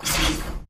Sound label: bullet generic titan shield scatter; Titanfall 2